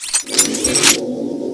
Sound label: voidpistol change; cstrike; weapons